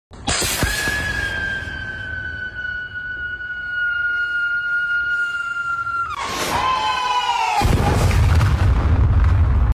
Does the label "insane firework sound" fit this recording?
Yes